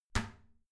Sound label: toilet lid close soft; haus-garten; badezimmer